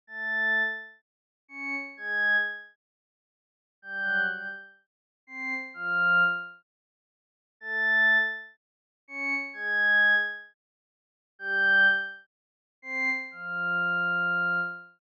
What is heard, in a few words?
group brass